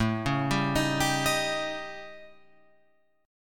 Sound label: A 7th Flat 5th A, Guitar Open F; A7b5 arpeggiated; JGuitar